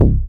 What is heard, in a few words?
BASS MONO